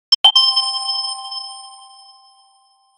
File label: collect energy ball in 2d